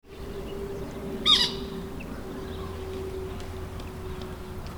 Moorhen

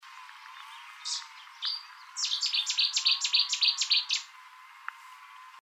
Cettis Warbler

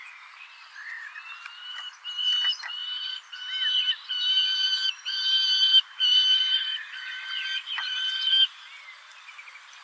Peregrine Falcon

